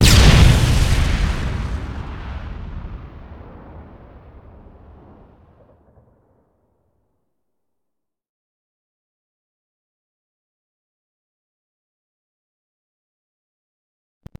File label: indirect, ships combat